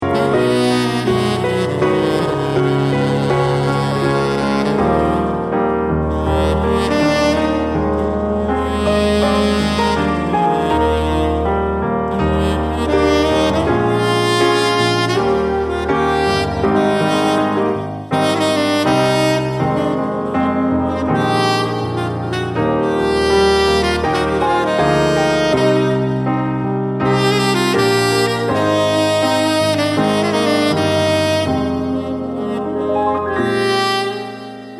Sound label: sax, Female Solo Sax Player